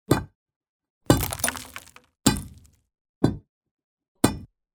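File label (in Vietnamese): Tiếng búa đập vào đá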